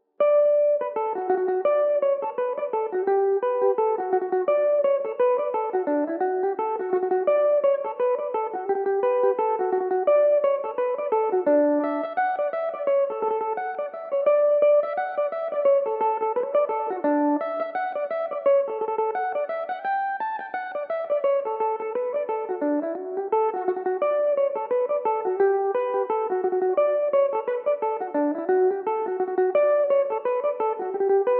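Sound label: played on my Belmuse EM-190 electric mandolin; The Sunny Banks/The Mills Are Grinding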